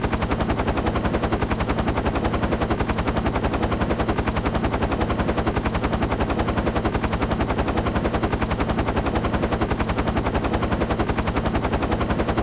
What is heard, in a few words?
heli